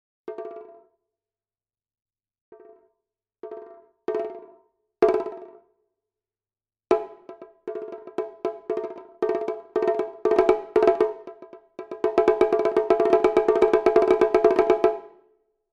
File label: taiko Shimedaiko Drag; fxpansion